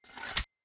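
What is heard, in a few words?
sword sheathe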